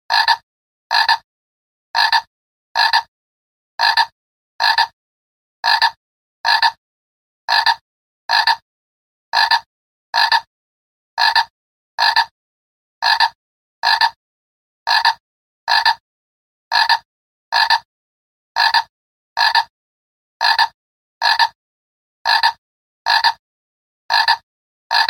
Frog Man

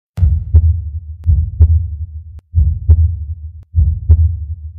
Heart Sound

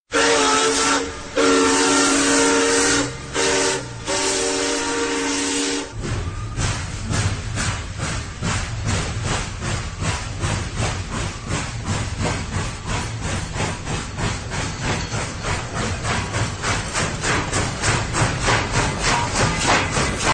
Classic Train

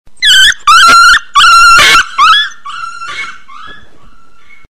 Lil Lill Dog Crying

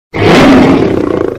Lion Sounds

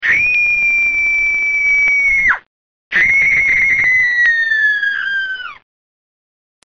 Scary

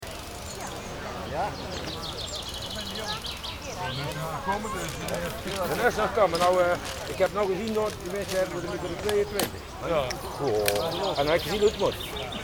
Nature And The Human Voice

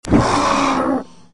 Dragon

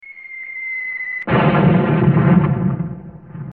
Bomb Blast

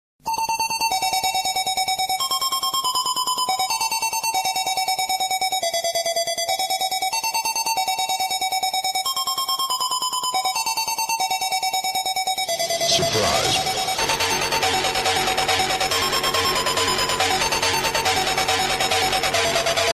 Surprise

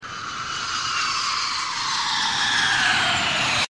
Aeroplane Sound

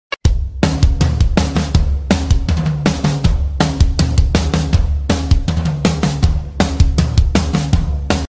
Rhythm Drums

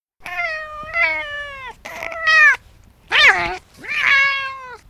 Newborn Cats

